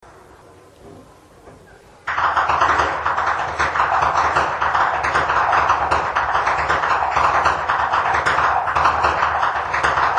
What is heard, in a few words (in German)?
schnalzen, flachgau; bergheim